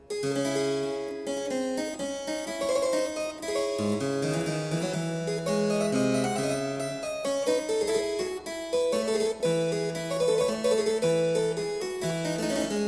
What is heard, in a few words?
Suite I in D minor Double, Harpsichord; Music for Harpsichord